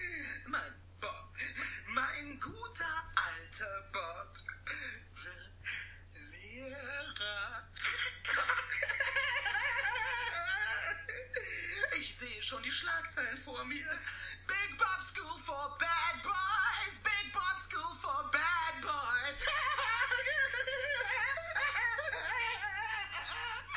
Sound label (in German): hier mal was zum lachen